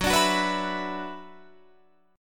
F sharp 7th Suspended 4th Sharp 5th F sharp down Guitar Drop D